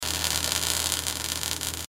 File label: Welding Gun Spark; Welders; Machines; INDUSTRY; Blastwave